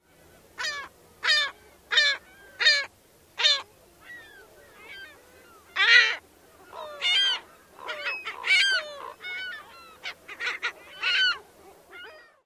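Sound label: California Gull typical hoarse calls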